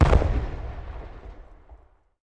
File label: firework distance